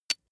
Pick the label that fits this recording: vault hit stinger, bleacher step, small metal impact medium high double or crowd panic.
small metal impact medium high double